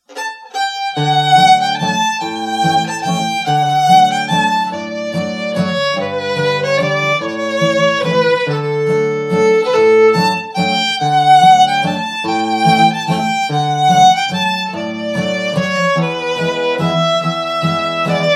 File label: Waltz